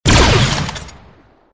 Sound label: fire gunner; lfs; arc170